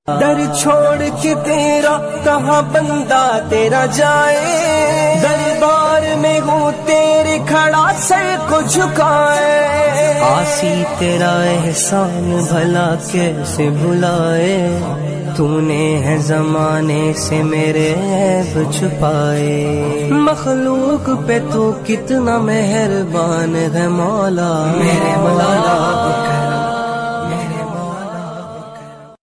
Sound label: islamic ringtone